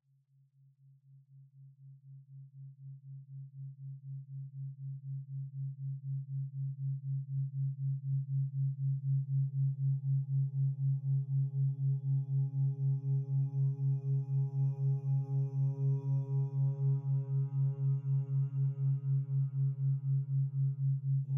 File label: OM Mantra (136.1 Hz), binaural, Meditation; hybrid